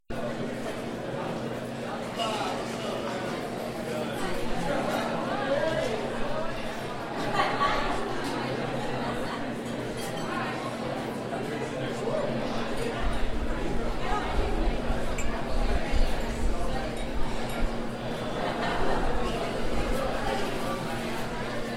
soundscape Dining Hall

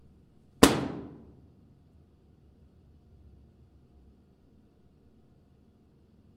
reverb lounge; pomona; wig

